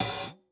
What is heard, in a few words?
hat open close